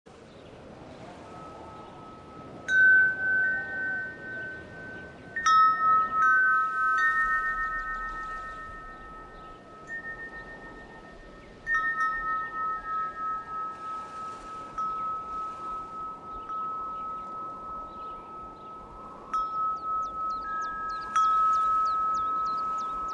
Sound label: windchimes